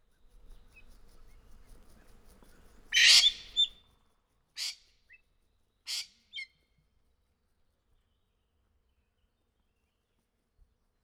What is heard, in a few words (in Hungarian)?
egyikük hangosat rikolt, aranyfácán; felsolajos zoo 2015; cardioid